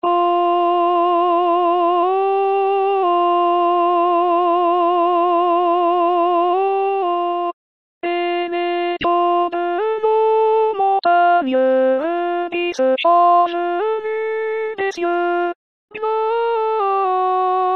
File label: Alto; Les Anges Dans Nos Campagnes